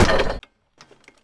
Cannon Drop; Civ4 warlords

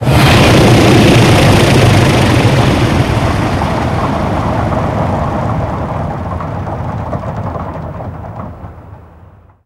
engine stop; War Thunder

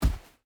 footstep hide sand jump land; Rust

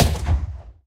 vya23 last shot right, guns; War Thunder

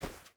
footstep hide snow run; Rust

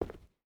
footstep rubber boots cloth run; Rust

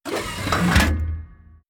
gun reload; World of Tanks

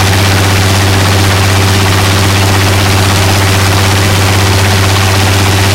griffon side, engines; War Thunder

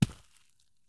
soil run; Escape from Tarkov

